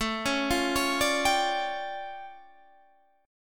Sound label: D Minor Major 11th, A